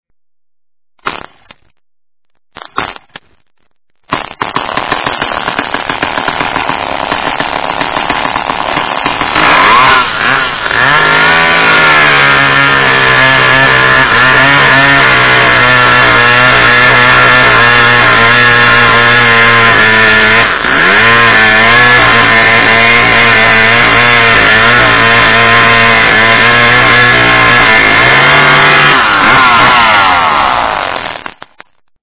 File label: Chainsaw